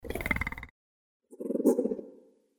L. catta clicks series followed by H griseus click series, Lemur; as L. catta and H. griseus become increasingly aroused, they begin to emit click series; The Audible Phylogeny of Lemurs; slowed-down versions of multiple-click Eulemur grunts